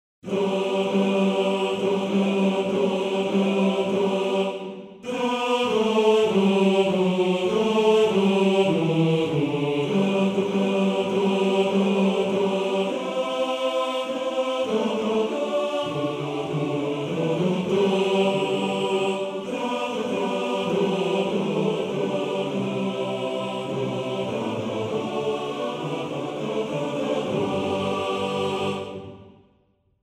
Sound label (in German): Chor; Quel Mazzolin